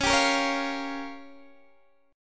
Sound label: Db 6th Flat 5th Db down Guitar DADGAD